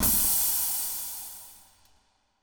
pressure release; SciFi Warehouse Kit

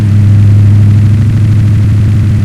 Acc, v8 c63

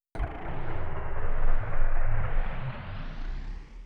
engine start; SciFi Warehouse Kit

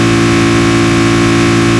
Acc, v8 c63

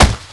body, collisions

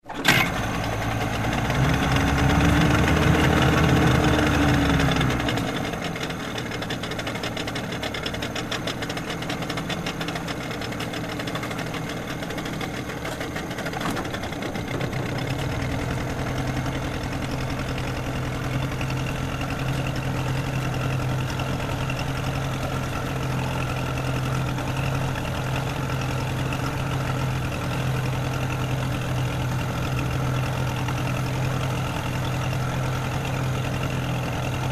Motor Start And Go, boat; ProjectZ

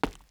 Concrete, Footsteps

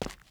Concrete, Footsteps

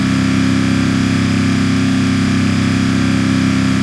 Dec, v8 c63

